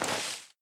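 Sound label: foliage footstep; adams venture origins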